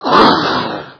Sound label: attack; Dark Village